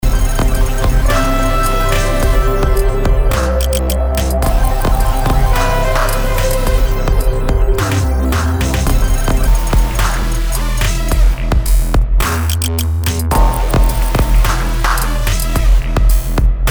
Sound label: Dubstep; Hanging around while the wind and rain abates; Hanging around waiting for the wind & rain to abate